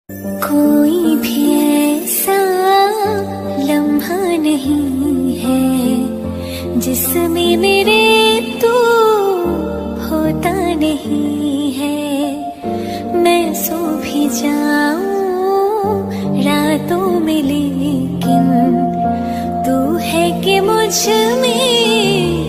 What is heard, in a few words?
Female Version